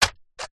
science fiction robot android single footstep wood